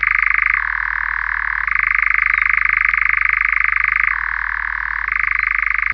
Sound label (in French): engoulevent d'europe